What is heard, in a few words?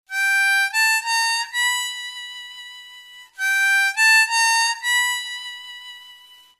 Overblow, harmonica